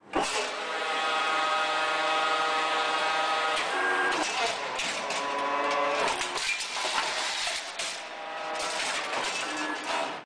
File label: autoloader, tank; Vehicles; civ14